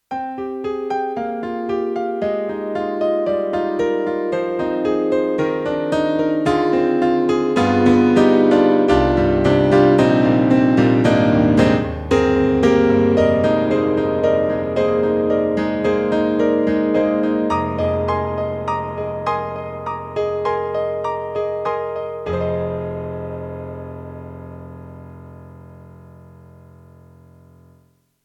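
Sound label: YAMAHA MU1000EX, Grand Piano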